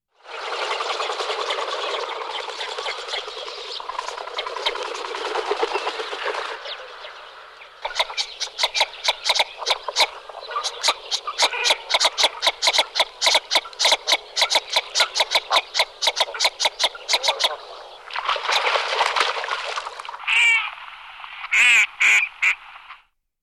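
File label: shirokonoska golos, northern shoveler voice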